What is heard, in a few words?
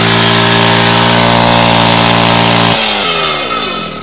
Chainsaw